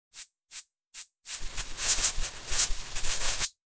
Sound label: graffiti spray